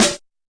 fx drum, Electro FX

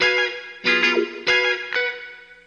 funky guitar lick; GUITAR LOOPS

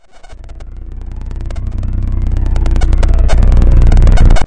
mosquito rampage swell, synth

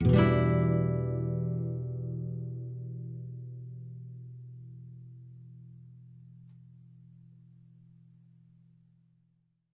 Nylon Chord E min7; Nylon Guitar Chords

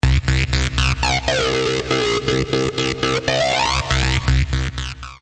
big beat bass

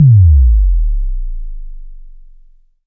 long low, Roland SH09, drum machines

